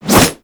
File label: Grineer Manic Jump Attack